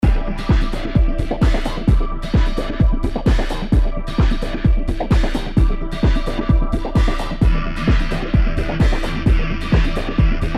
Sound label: Loop